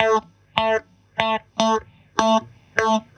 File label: GTR, Roots Disco; USB Soundscan vol.32; AKAI